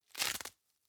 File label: velcro open slow